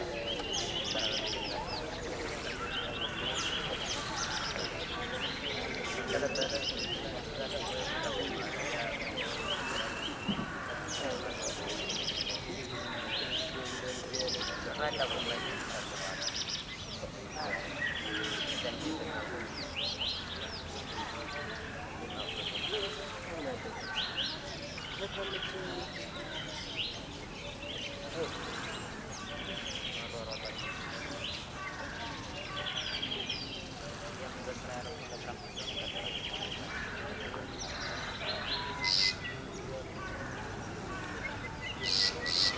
Village OK Loop; skykeepers; ps4 windows xbox one gamerip 2017

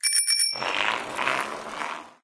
Bicycle Leaving

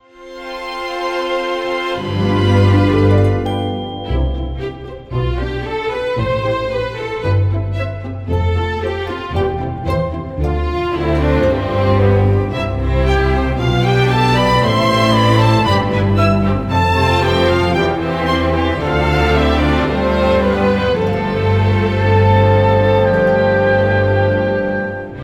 Gallary Intro

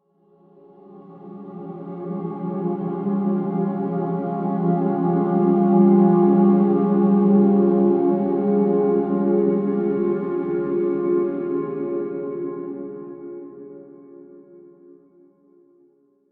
Game Lost; Promethium

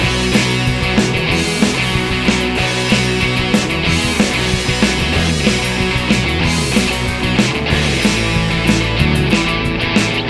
Party Time Music Slam Loop; Plants vs Zombies Garden Warfare 2; ps4 windows xbox one

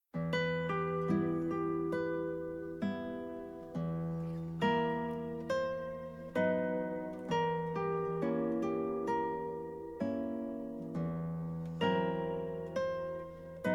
Guitar

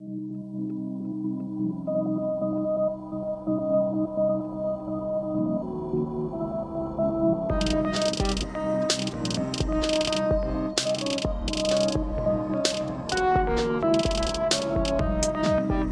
synthrock; Avoid Sensory Overload

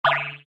Ninja Die; Fancy Pants World 1 Remix

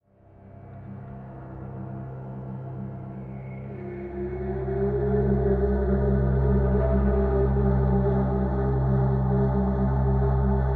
Board Game Event Fire

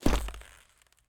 ice land

